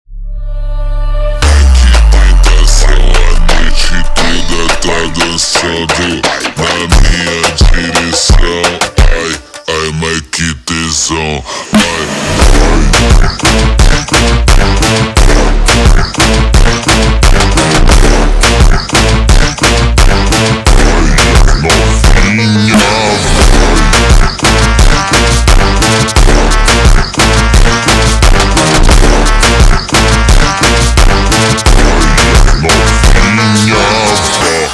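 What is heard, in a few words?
super Slowed, phonk music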